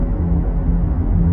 ability celestial hammer loop

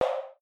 ui click, sci-fi

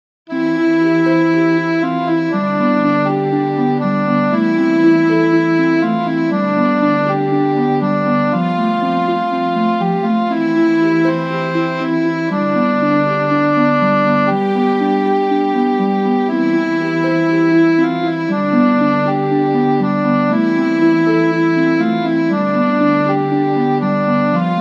Level music, Calm level music

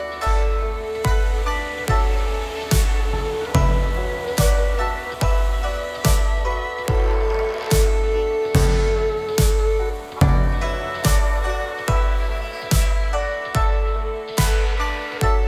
LoFi Loop; whatever happens happens

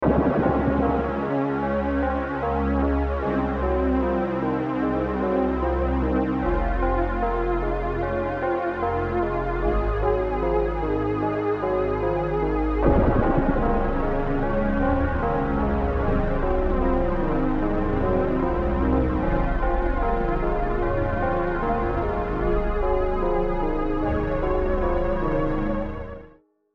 despair; Something terrible just happened, I suppose.

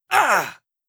damage grunt male

